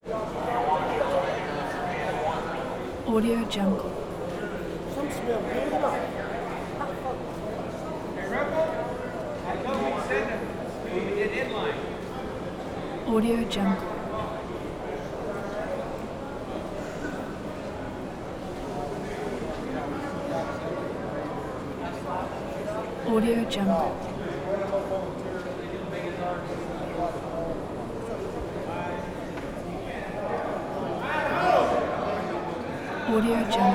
interior prison building active chatter bump; prosoundfx

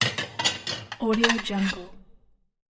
household plates ceramic stack

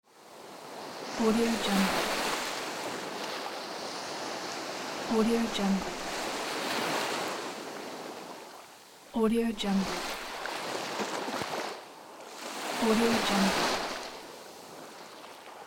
beach wave ambience

